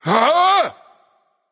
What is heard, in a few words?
surprised; smite; voicepack; xbalanque